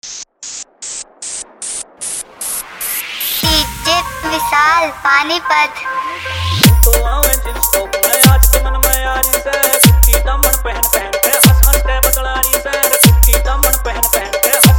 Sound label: Fast Remix Haryanvi Dj Song